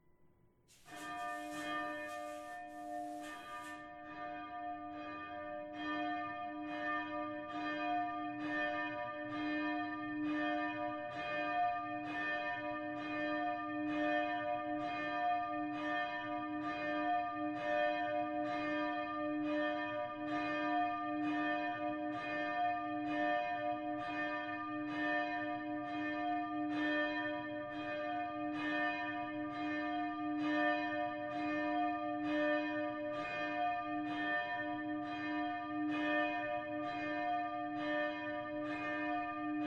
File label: bell south tower; 08 alleheiligen